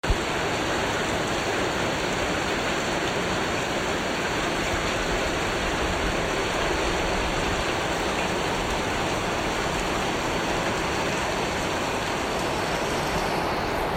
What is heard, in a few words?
heavy rain